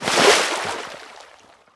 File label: Piranhas, jump